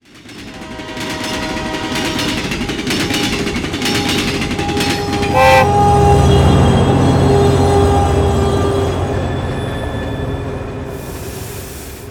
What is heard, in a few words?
Train Stopping; Rebel Cops